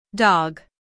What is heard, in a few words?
dog